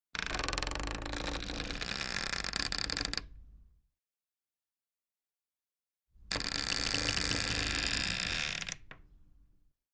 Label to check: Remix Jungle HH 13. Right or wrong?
Wrong